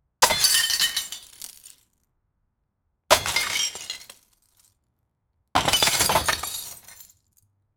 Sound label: stone breaking and metal, metal grinding